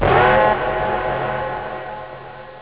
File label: horn